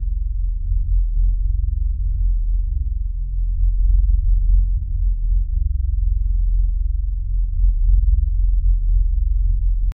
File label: dark ambient suspense; no melody